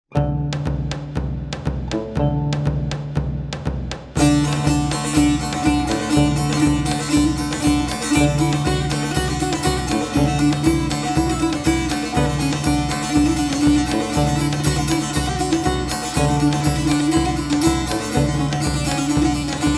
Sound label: Saz o Taar